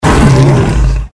dragnold hit; Jaminporlants

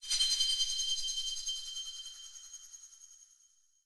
Mimic Explosion; Lurker; Evolve; Gorgon

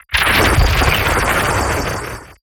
Teleporter Warp Blast Teleport; Evolve; Wraith

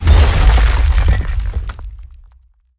Rock Prison Formation; Evolve; Behemoth

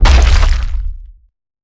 Jumpland Walk Run, Behemoth; Evolve

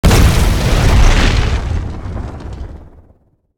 Rock Throw Impact Swt FLAME; Evolve; Meteor Goliath

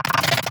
Lurker emote; Evolve; Gorgon

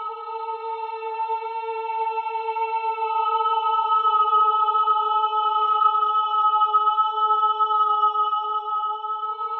Mimic Loop; Lurker; Evolve; Gorgon

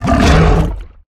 Exertion Mortar; Evolve; Behemoth

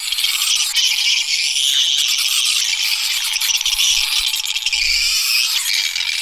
Ability Spirit Walk Loop Scream; Lurker; Evolve; Gorgon

